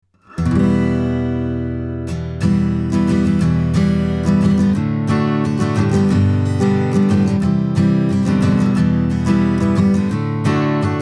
Acoustic Git OC818 4 to 1 0 9ms Att 1 Re up to 10dB